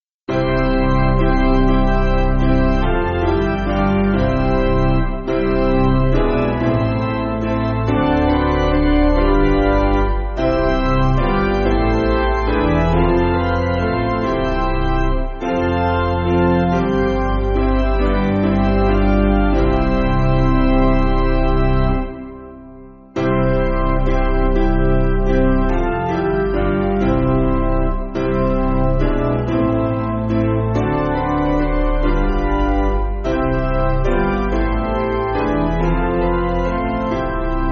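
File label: Piano, Backing Files for Churches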